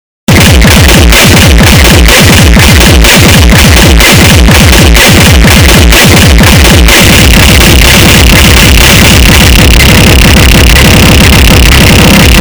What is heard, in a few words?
reggaeton